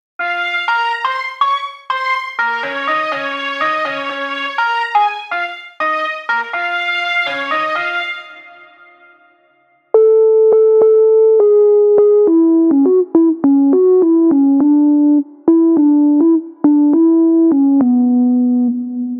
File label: Melodies Soul